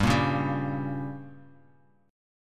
G Diminished, Bass Standard; strummed; JGuitar

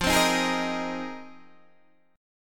F Minor 11th, Guitar DADGAD

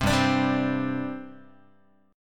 G Suspended 4th G down Guitar; strummed; JGuitar

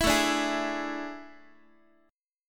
A Major 7th Suspended 4th A, Guitar; AM7sus4; strummed; x,x,7,7,6,7

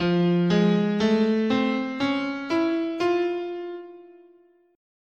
F Augmented, scale

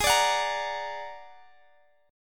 A sharp Augmented 7th, chord

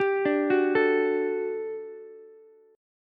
G Major 7th Suspended 2nd G down Ukulele; GM7sus2 arpeggiated

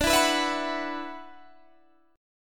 E 7th Suspended 2nd, D, chord

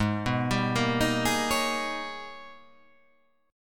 Ab Minor Major 11th, AbmM11 chord; arpeggiated; JGuitar

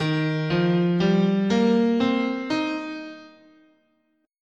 D sharp Major Pentatonic, guitar, scale

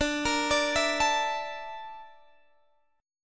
Bb Major 7th Flat 5th

